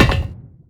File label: step